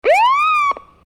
siren short; garrysmod

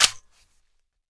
vollmer bolt forward; mg; garrysmod

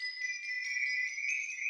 beat; garrysmod

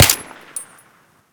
silenced, weapons; garrysmod

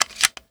insert, weapons spas 12; garrysmod

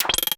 cry open; garrysmod

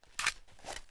ots33 mag out, pistol; garrysmod

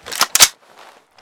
sr25 charge, weapons sniper; garrysmod

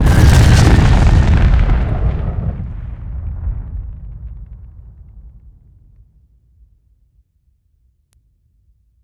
explo; garrysmod; gbombs; srb

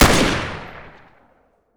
m14 fire, weapons; garrysmod

